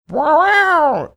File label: making the alarming sound; oscar